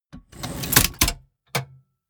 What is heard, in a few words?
mechanics small snap